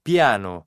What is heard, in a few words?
piano